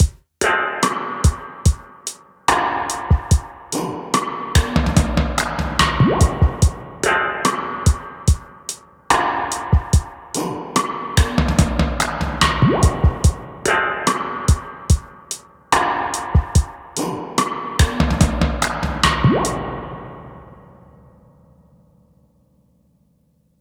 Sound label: test springs; TAD8